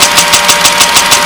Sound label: heli gun empty; tf2